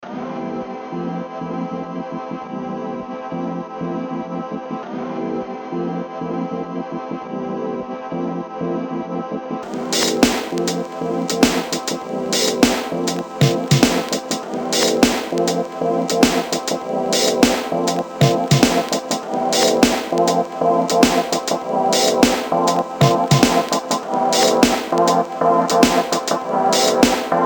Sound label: deep house stealth